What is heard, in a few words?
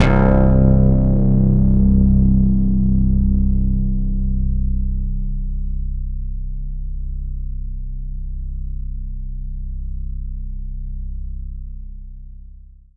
Kurzweil K2000 Big Mono Bass C1